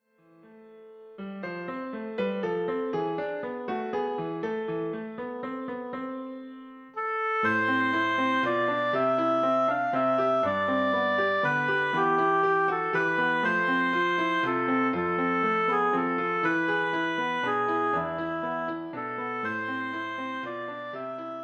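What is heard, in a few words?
Greensleeves, Oboe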